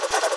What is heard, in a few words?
default wastegate flutter; rigs of rods